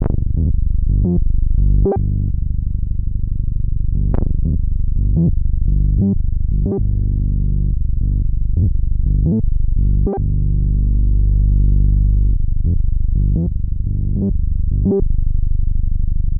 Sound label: Bass; DIVA preset; HS Bass Nine